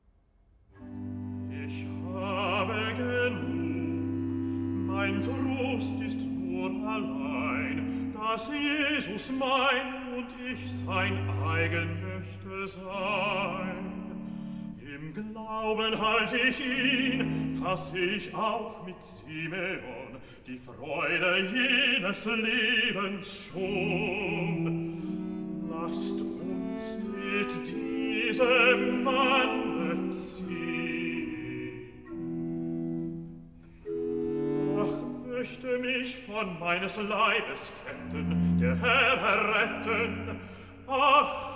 Recitative (bass); BACH; CANTATAS; BWV 82; Ich habe genug

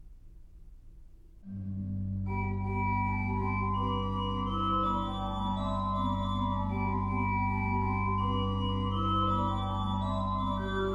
In dulci jubilo, organ, chorale preludes; BACH; Orgelbüchlein

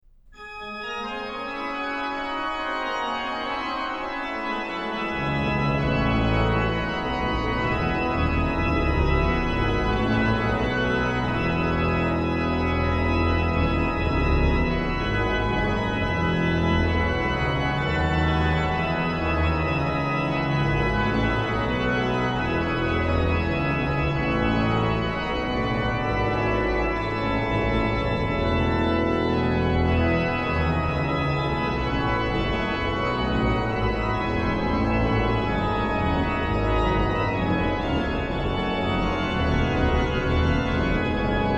organ; BWV0627-1; Christ ist erstanden Vers 1; BACH; BACH ORGAN WORKS; CHORALE PRELUDES

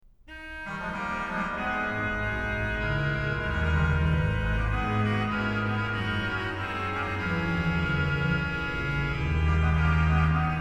ORGAN, Chorale Preludes; BWV0642; Wer nur den lieben Gott last walten; BACH; BACH ORGAN WORKS

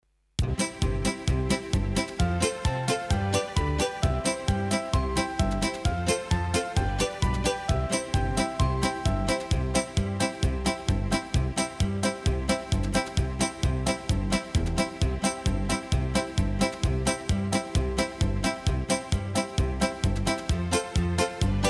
Instrumental, karaoke, Bulgarian; Burqno Burqnke; Bulgarian Folk Songs; Children

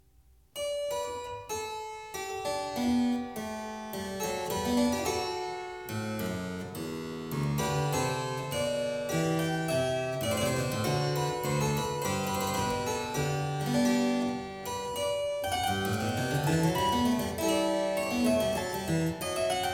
G major, Loure; French Suite No.5; BWV 816; BACH; CLAVIER WORKS; FRENCH SUITES

